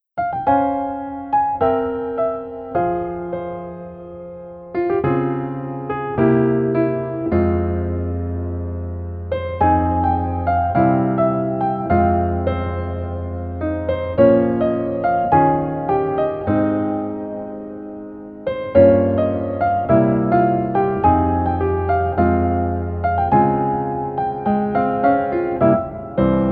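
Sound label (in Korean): Piano version 멜로디 MR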